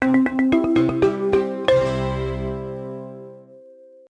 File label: Jingle Victory; Petz Rescue Wildlife Vet; NDS